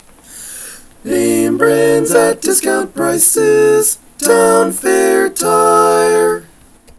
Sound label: Tenor